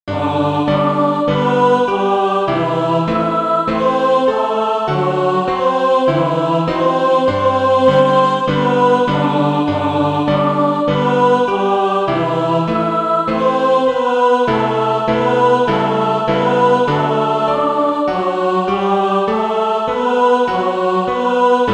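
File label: mixed, SATB Practise Music; Cleator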